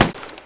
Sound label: crack; night watch; the vigilante at night